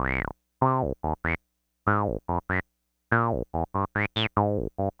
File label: All Blues and No Miners